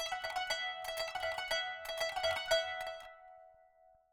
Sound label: kanun